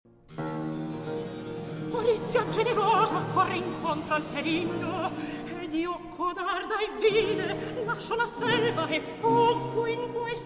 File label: Recitativo; Antiope, Ippolita